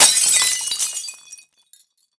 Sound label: Amb Destruction; Red Orchestra Ostfront 41-45